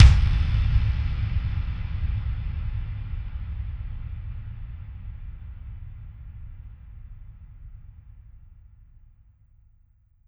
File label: FX Impact